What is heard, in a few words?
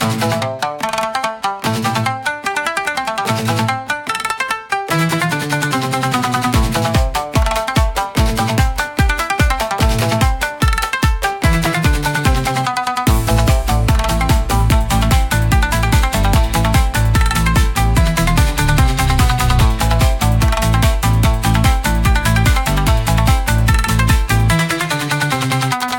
Crimson Gate Charge, cinematic japanese style battles